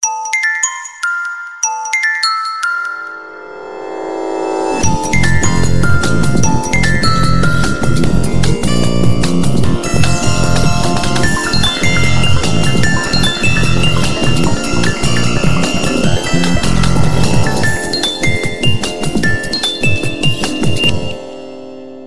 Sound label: OPPO Official Ringtone